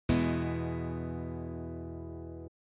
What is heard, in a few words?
E major